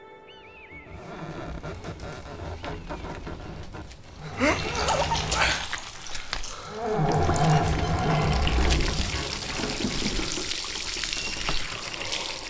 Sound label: Water Hitting Ax Skin; quantum city disc 2